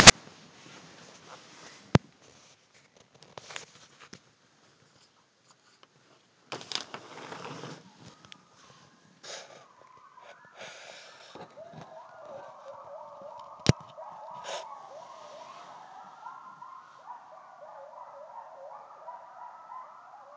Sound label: coyotes in spruce